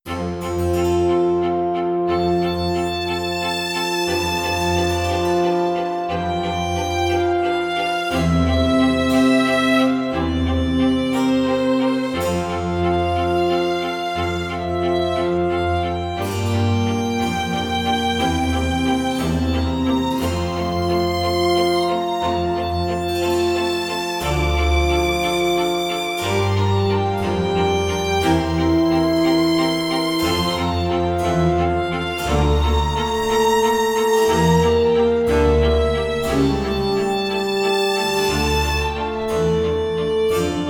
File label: Baroque style violin concerto